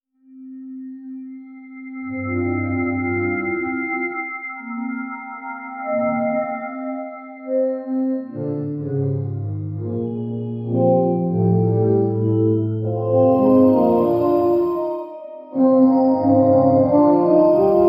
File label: background music and sound, tavern